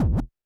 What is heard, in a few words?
percussion, Roland TD-20